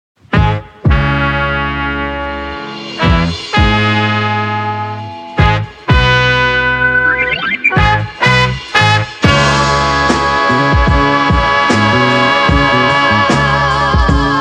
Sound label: Cm 155; Masada Beat Block purge vol 2